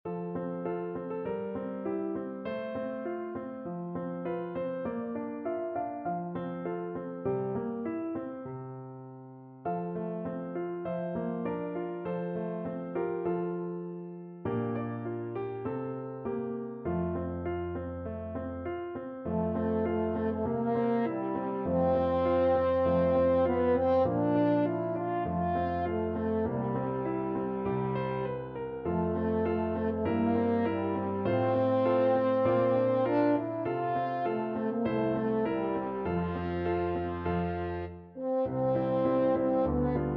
french horn

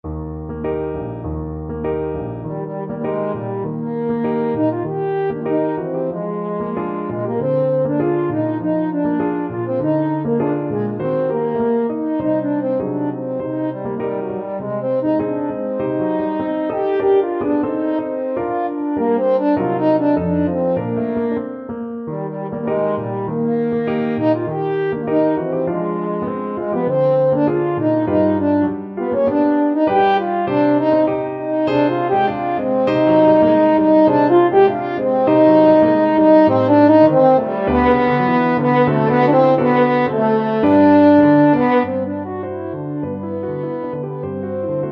albeniz tango, french horn

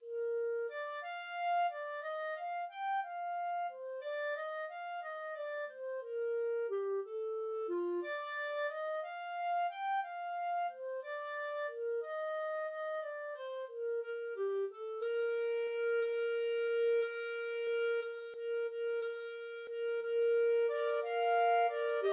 quem clarinet trio

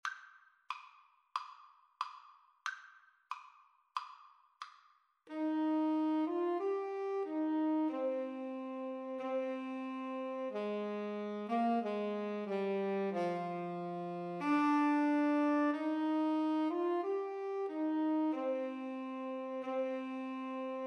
Alto Tenor Sax Duet

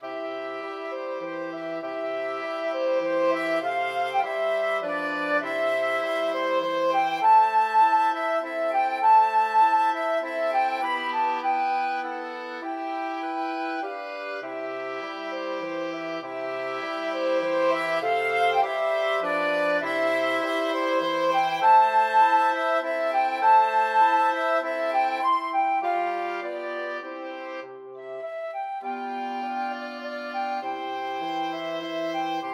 waltz, flexible mixed ensemble 4 players

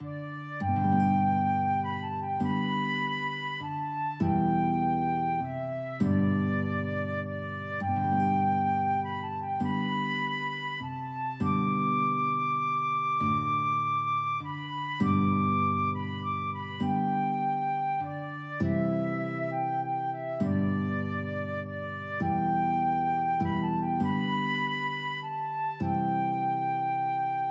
amazing grace FL GUIT duet, guitar flute duet

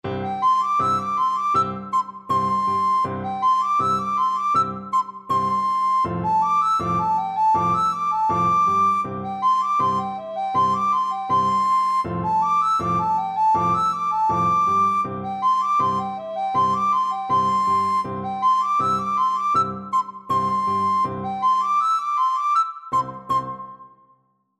recorder

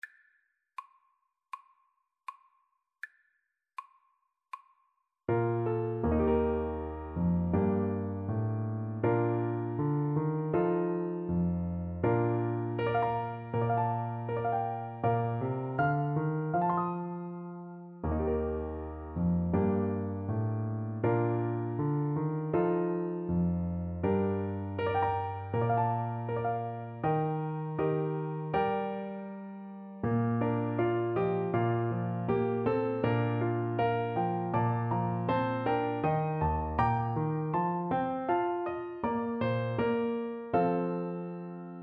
clarinet

